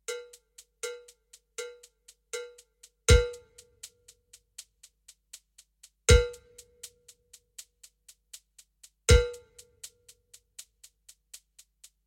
standard 12 bell trainer fast

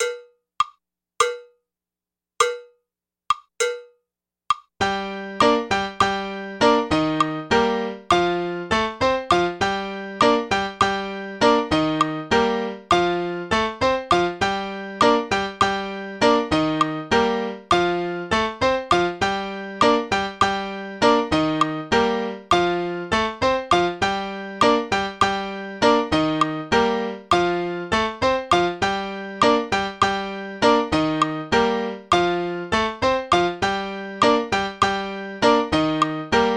tumbao slow

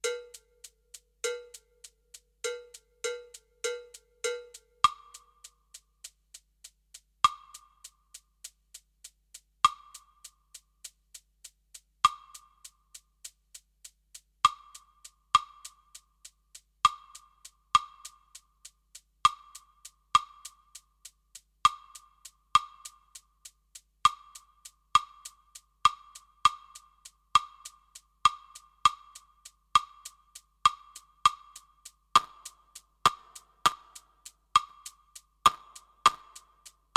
tresillo trainer slow

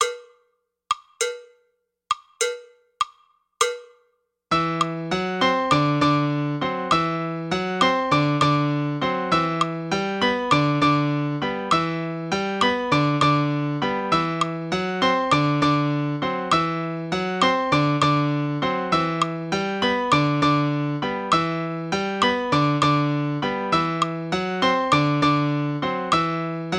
tumbao slow

